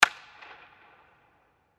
Hockey Stick Call For Puck Smack Tap Ice Single Hit; Blastwave